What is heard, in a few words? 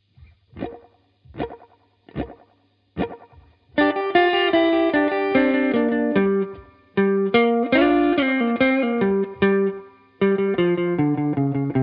blues